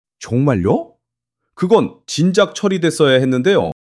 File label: surprise